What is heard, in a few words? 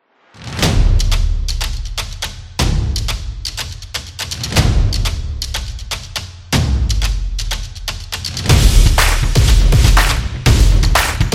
The Drums Solo Dynamic, samba